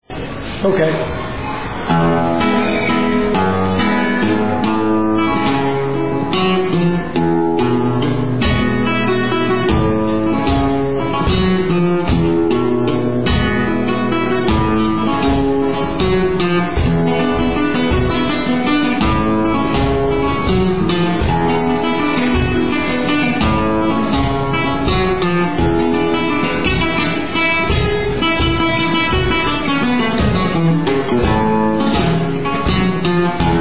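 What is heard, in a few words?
Guajira, flamenco guitar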